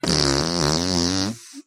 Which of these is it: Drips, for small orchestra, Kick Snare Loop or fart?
fart